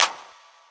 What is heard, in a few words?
Clap n Snap, Snares